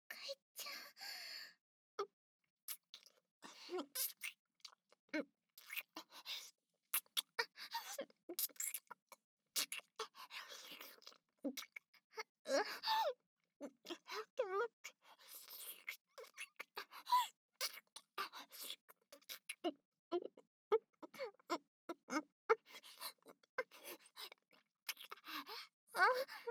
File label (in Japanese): キス 騎乗位喘ぎ